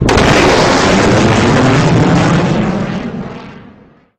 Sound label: Breaking Sound Barrier